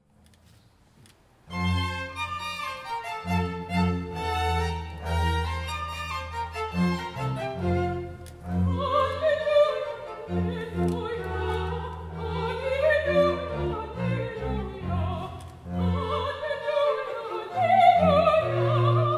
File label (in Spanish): aleluya con soprano; mozart